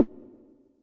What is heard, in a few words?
snap, Yamaha Tenori-On Snapkit